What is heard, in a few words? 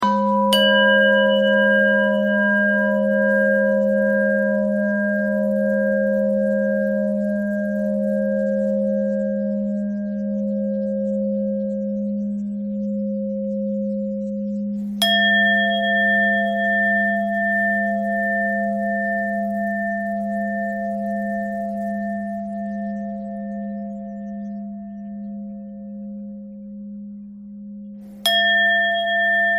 bell thread pentatonic